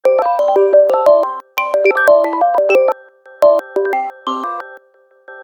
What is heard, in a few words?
Ringtone; Sharp AQUOS sense10 SH-M33